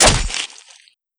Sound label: combat knife hit flesh; tf2